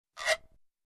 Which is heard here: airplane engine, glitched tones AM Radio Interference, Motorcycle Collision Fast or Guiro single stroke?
Guiro single stroke